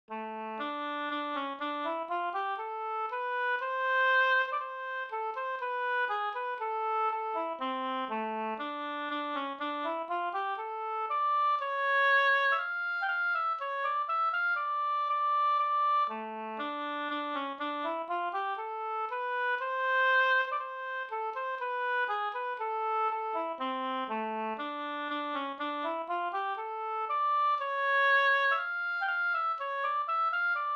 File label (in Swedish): brudmarsch; Enviken